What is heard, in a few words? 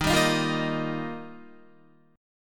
Eb Major Add 9th, Guitar; Ebadd9 strummed; 4E,4A,5D,5G,5C,6F; JGuitar